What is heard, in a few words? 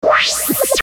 single asteroid tracker pass; sound ideas